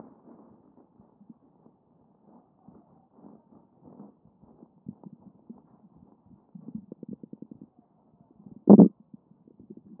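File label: anterior chest, position 1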